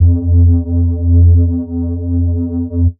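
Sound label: bass sustain F; Polyend; ARC Noise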